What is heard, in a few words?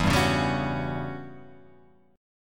E Minor 6th Add 9th chord